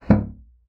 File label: bassoon slap; Xsample VOL-6; C BASSOON